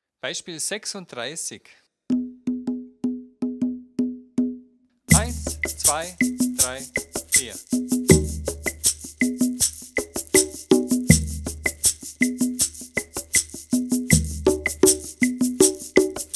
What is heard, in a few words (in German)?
viererrhythmus